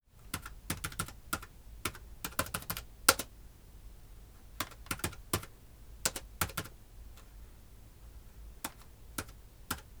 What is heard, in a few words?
Computer Keyboard Typing Mid Distance; Media Basics; Fly Sound